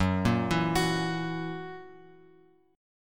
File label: F sharp 7th, guitar; F#7 Chord {2 1 2 X X 2} arpeggiated